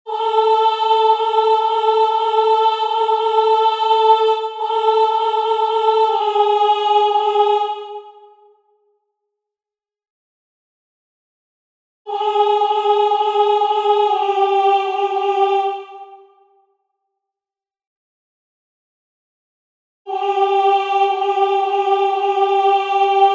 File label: Messe solennelle Et In Carnatus Soprano; Gounod